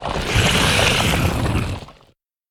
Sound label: Totem Attack